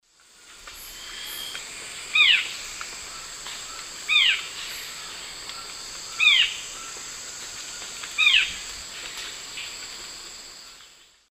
Cercomacra cinerascens, call, Eastern Lowlands, S. America